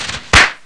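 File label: firework; Screen Saver Studio Deluxe 3.01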